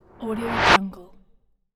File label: suction